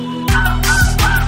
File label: Mating Call